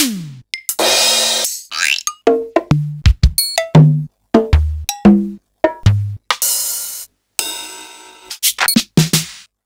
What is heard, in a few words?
Elka Drumstar 80; Sliced